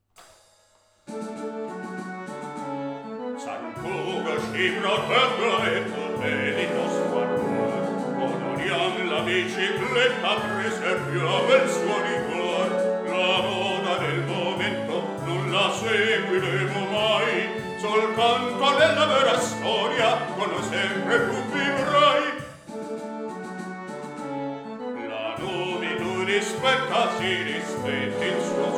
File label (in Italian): INNO Cantato; NUVI; ufficiale